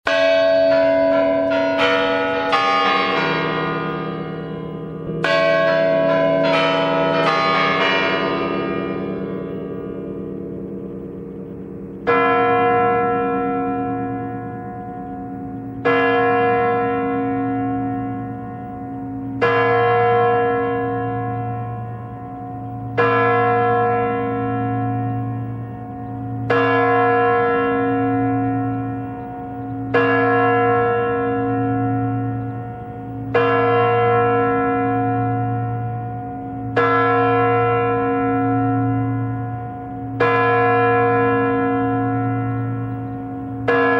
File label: cremlin curants; noviygod